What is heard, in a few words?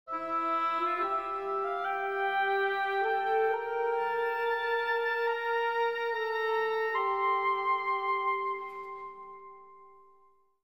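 melody; Myst III Exile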